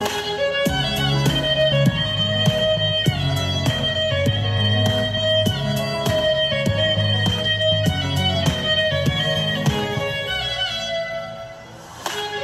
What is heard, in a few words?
bollywood instrumental music ringtone